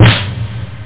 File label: DRUM ROLL END